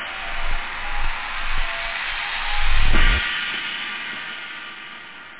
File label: ELECTRO, CYMBALS; Workbench Add-On - Volume 1